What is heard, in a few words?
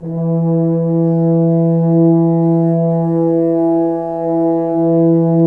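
French Horns mf, Dry; Roland LCDP06 Brass Sections; F.Horns 2 mf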